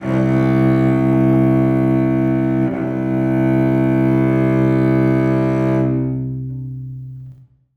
cello c2; Sonatina Symphonic Orchestra

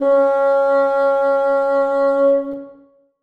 bassoon c#4; Sonatina Symphonic Orchestra

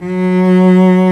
Solo Cello F#3; Strings

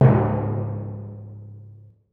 timpani f c1; Percussion; Sonatina Symphonic Orchestra

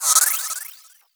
WIPE; akai; mpc1000; factorypresetsamples

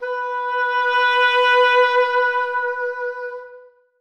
cor anglais b4; Sonatina Symphonic Orchestra

